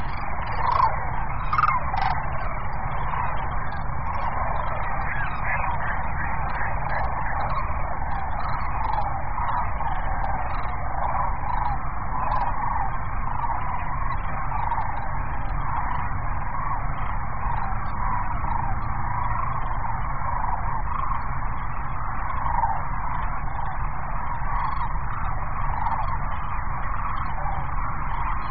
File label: Sandhill Cranes